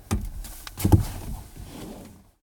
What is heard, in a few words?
Jump into car; the 39 steps